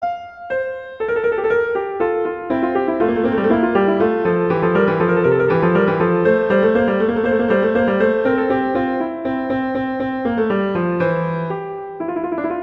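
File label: Sonata Allegro, Piano; Telemann; Canonic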